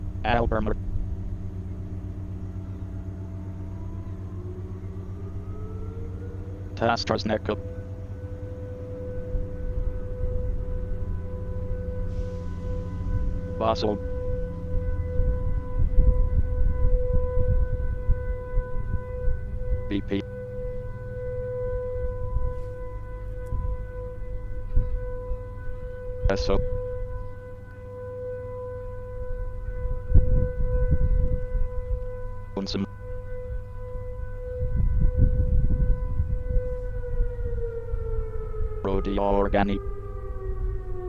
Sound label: siren no beats